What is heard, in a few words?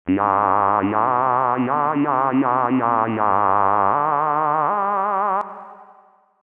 Vocaliz